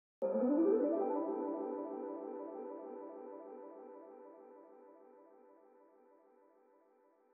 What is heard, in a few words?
97 BPM E Min Arp, Loop Stems; Melodies; Cymatics; 2022 Melody Mystery Expansion; Sleepless Night